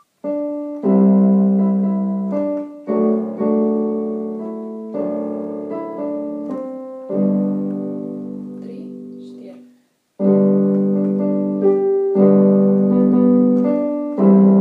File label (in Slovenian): Sijaj sijaj sončece klavir